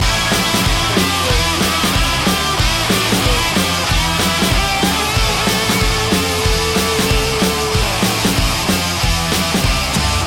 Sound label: fuzzbomb loop